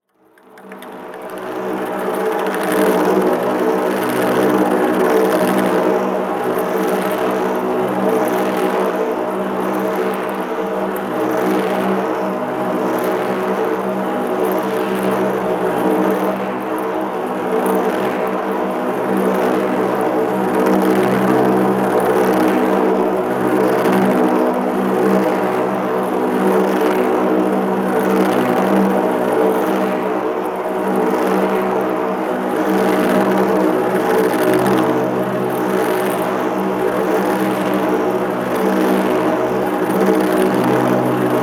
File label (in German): Rahmentrommel Ping Pong Bälle